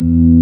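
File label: ORG B3 E 2, organ; Best Service Gigapack 2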